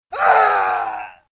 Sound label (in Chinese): 男生惨叫